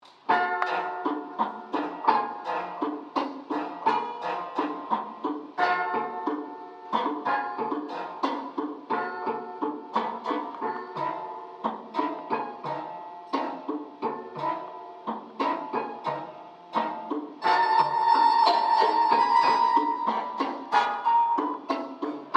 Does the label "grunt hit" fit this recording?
No